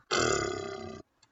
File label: Deflating Balloon